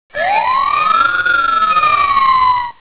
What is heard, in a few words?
siren